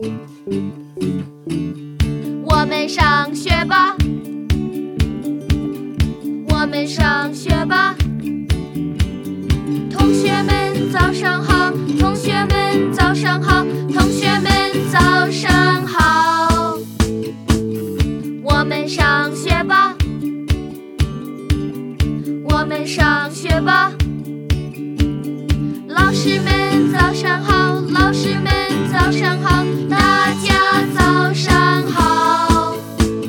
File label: song chinese